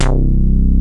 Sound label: PRO-1 bass; Kid Nepro; Elite